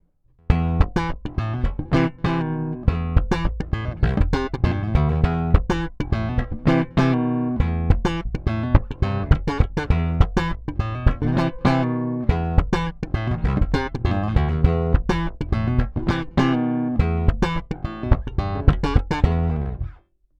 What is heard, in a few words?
Preci DI